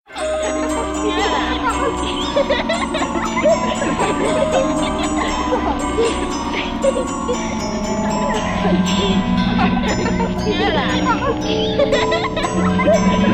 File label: Plain Creepy